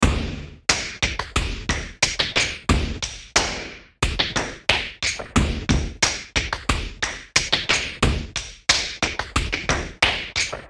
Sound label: Space Break